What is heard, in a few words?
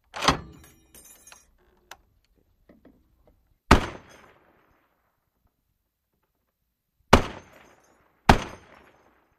.50 Caliber: Single Shots With Shells Dropping